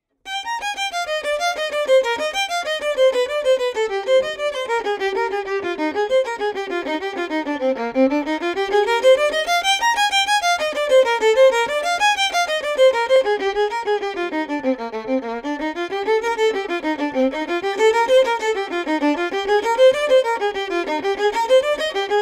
Violin Etude; Kreutzer; No. 5; 크로이처 바이올린 에튀드 5번

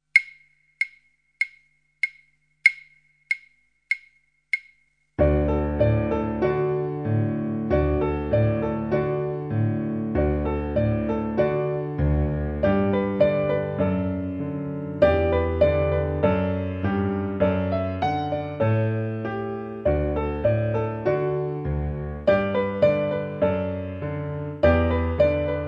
piano

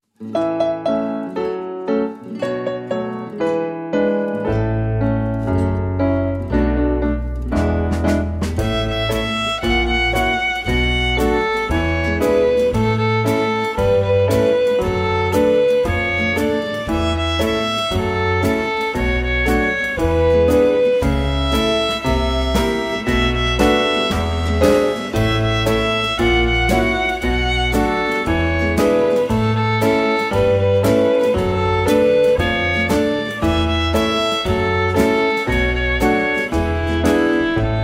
Fiddle Time

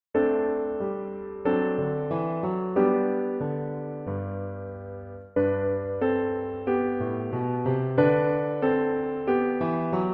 A Restful Tune Accompaniment Track

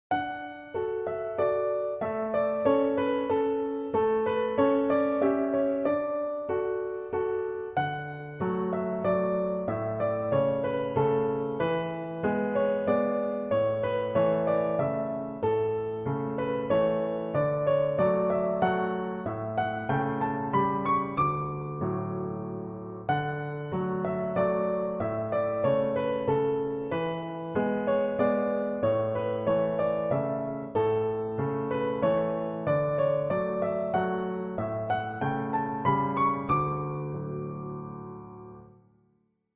Musical Box Accompaniment Track

